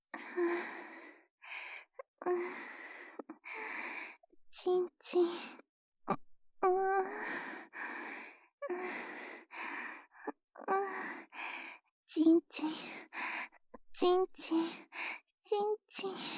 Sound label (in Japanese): 喘ぎ; 名月